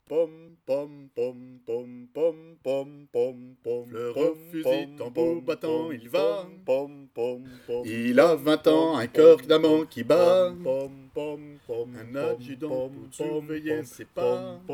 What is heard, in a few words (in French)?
Sopranos